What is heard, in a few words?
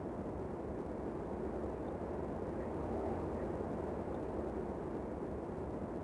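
ambience Wind Medium; Star Wars Obi Wan; XBOX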